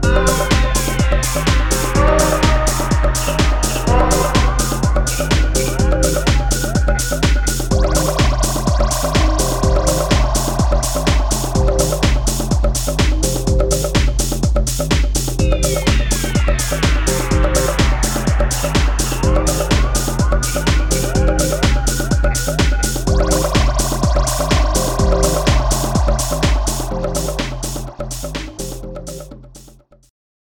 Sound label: BoomBox loop